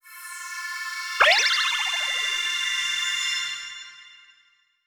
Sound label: aura loop